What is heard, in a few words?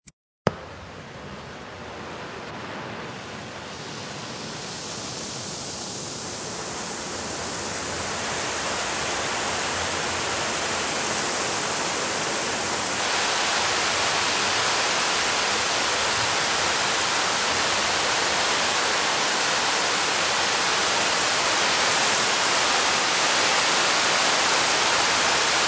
Industry Tool Torch Light; Heat Guns; Machines; Lynne Music